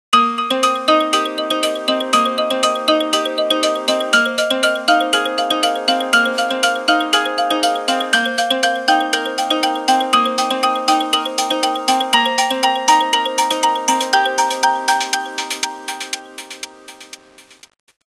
alarm, dawn; HTC S740

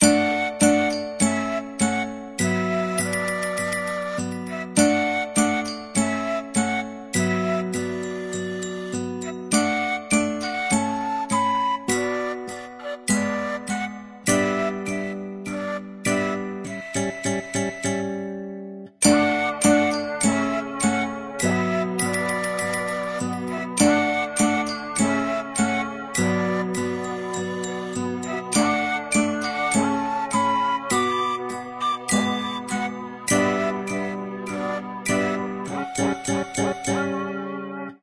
For Children; Samsung Movie Maker 1.2.06

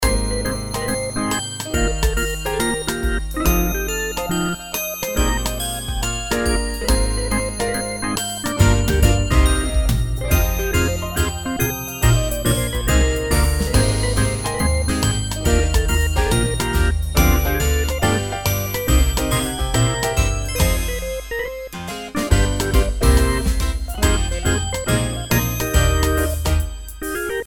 Humorous

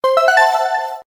sms; ZTE; Majesty

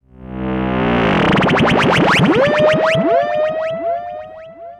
fx; Google; NewWave Labs

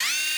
Zoom In

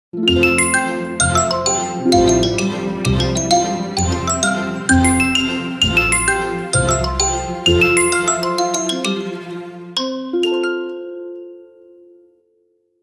Harping, ringtones; OnePlus 12

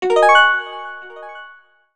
Freefall Alert; Sony Ericsson; C901; PlusFourSix; Lars Edvall

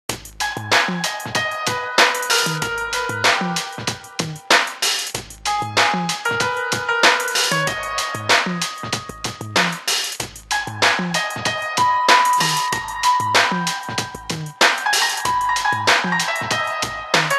Ring; HTC; AT&T-Tilt-2; Jack

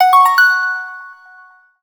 Progress alert; Sony Ericsson; PlusFourSix; SE Sound Project; Lars Edvall

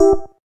gayageum, keytone

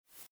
Voice message window; Samsung Galaxy Pop SHV-E220s

